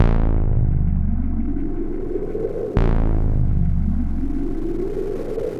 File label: Bass, Synth Bass Loops; Sonic Foundry (Sony Creative Software); Groove Elements